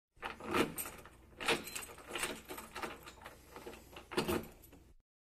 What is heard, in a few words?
Opening A Door With A Key